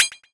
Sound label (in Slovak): predbehnutie; zombieroad